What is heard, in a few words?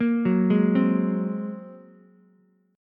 A sharp 7th Suspended 2nd Sharp 5th, down, Ukulele; arpeggiated; chord; JGuitar